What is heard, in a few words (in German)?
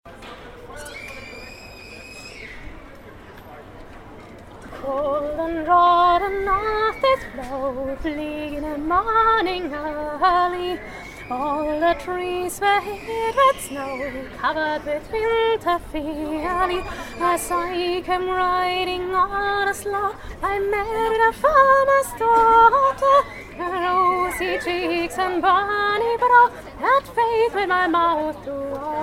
Lied lauter Plus Atmo